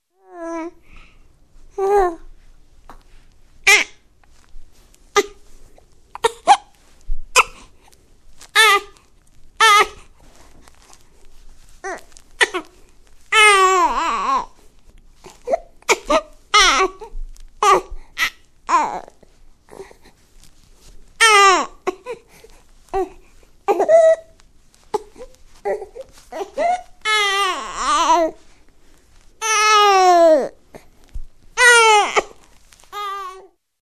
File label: Baby Try Cry, Funny baby ringtones